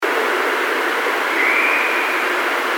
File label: Whistle